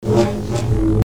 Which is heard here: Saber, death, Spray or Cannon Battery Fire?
Saber